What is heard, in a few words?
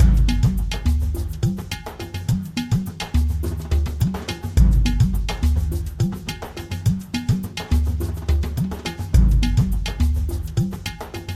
Sting; Dino Tales